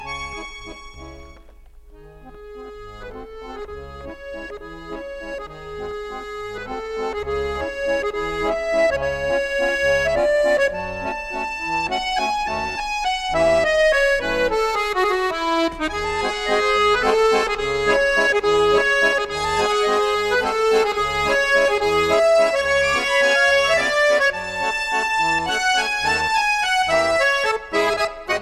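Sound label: accordion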